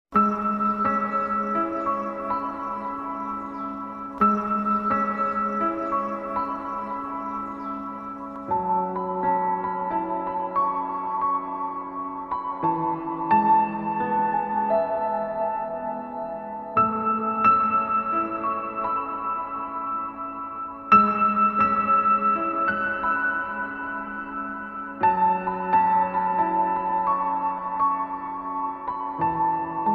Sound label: sad bgm; aditya varma